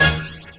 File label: LASER SHOT, Hip Hop; E-MU Formula 4000 Series Vol. 1; Hip Hop Nation; Laser Tag 100